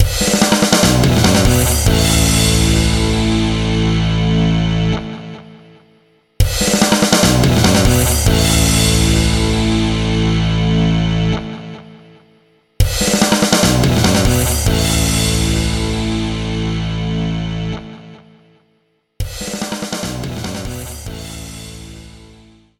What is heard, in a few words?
Game Clear; Sega Superstars Tennis